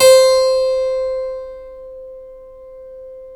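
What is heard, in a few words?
C4 clavinet right, Keyboards Acoustic; USB Soundscan vol.17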